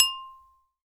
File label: Glass Lid, Noises; Attacks Misc; Xfer Records Serum; Serum Presets